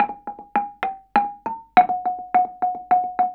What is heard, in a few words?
KULINTANG